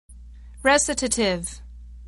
recitative

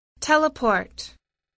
teleport

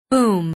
boom

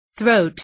throat

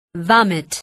vomit

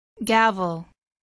gavel

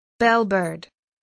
bellbird

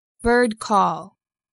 birdcall

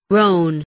groan

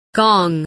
gong

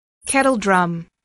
kettledrum

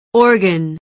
organ

